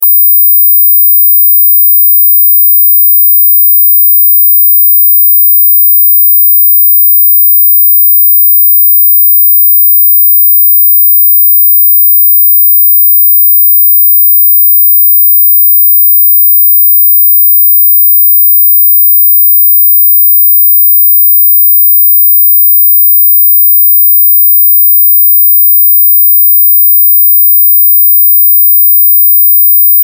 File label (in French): Sonnerie anti-jeune Moustique